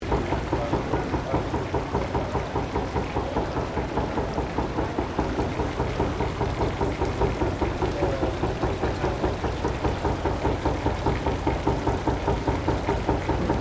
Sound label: MACHINERY WILFLEY VIBRATION TABLE OPERATING LOOP, Colonial era machinery